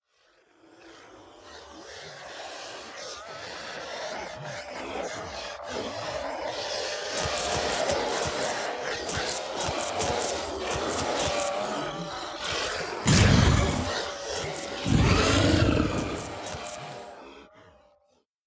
Fail Ending Ghost; The Town; Devour 2021 gamerip pc macos

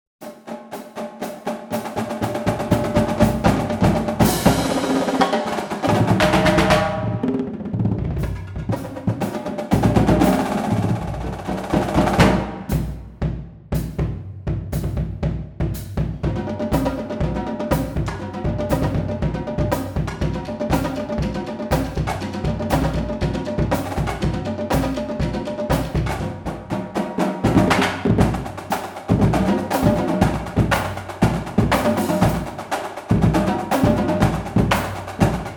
Cadence; Disney Infinity

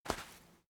Footstep Sand

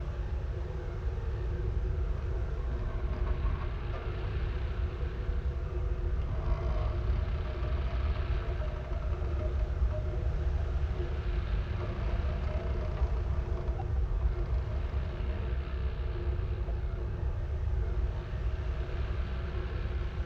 Dark Ambience; Five Nights at Freddy's; FNAF Sister Location

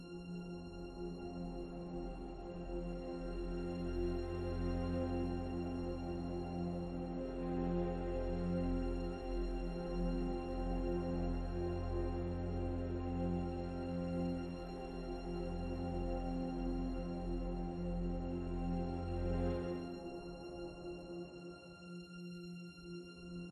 Start Loop

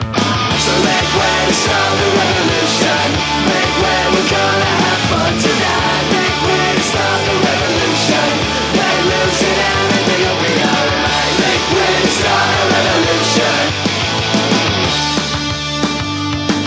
Music Skydiv; generator rex agent of providence

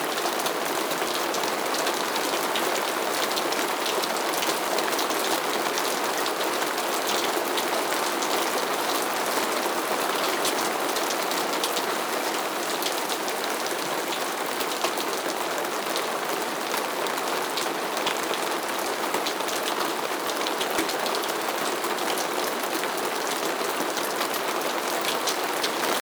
anbience rain light outside wood

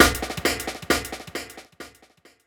chase beat last hit 100bpm; chicory a colorful tale; ps4

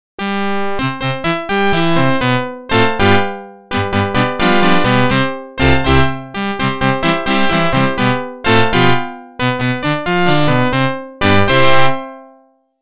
Organ; NHL 94

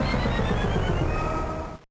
Jingle, gba; Okuman Chouja Game: Nottori Daisakusen 2001 gba

